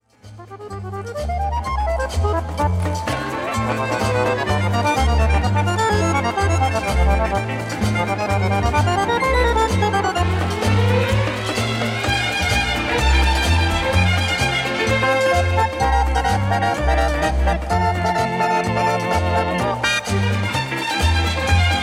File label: Harmonica Samba